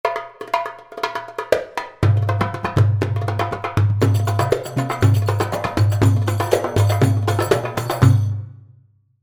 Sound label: Sudasi 120, darbuka